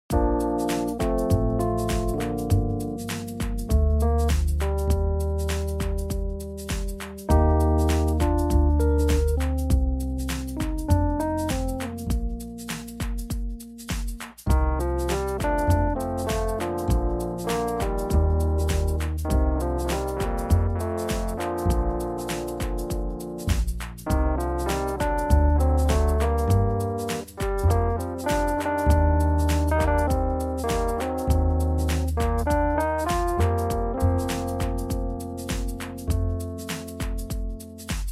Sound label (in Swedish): 3 tromboner